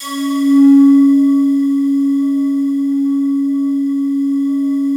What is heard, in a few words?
FOGATK2 C#3, Yamaha VP-1; Music Store; 90's Sample CDs; FOGATTACK 2